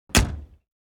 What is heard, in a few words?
close